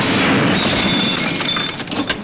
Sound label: crash; Driver